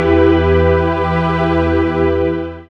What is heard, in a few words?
SYN DANCE; Sound & Vision - Gigapack II